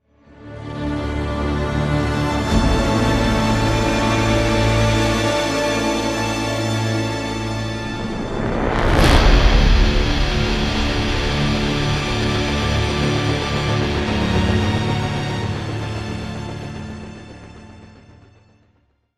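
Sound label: sting, string swell guitar; transformers war for cybertron gamerip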